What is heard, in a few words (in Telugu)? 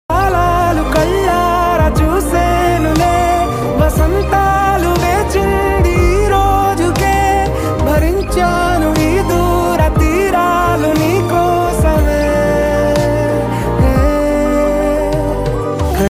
telugu songs